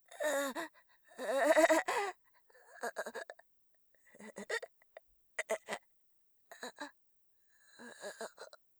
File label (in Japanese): 腐り落ちる; 効果音なし